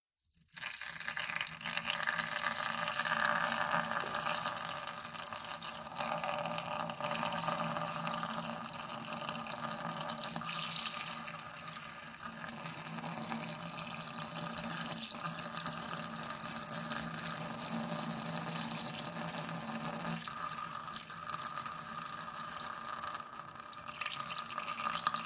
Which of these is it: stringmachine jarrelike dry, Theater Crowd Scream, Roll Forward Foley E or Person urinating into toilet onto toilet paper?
Person urinating into toilet onto toilet paper